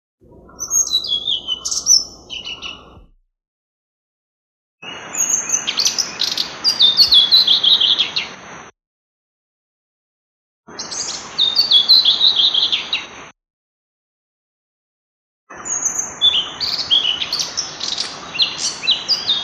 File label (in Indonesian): kicau sikatan blue throated